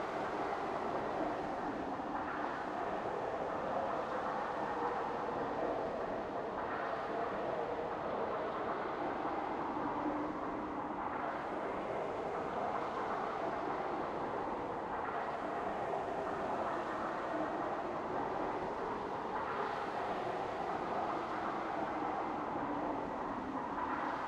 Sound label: Amb Wind Photo-Flap Loop